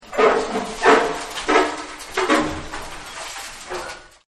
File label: Like Pulling Teeth, Crunches; Objects